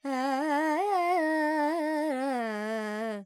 Purvi Kalyani, Vocals, Carnatic Female, Alaps, Dry; Sample Banks